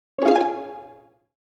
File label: Mobile game strings alert notification